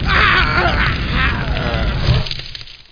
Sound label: fire deth; TNP demo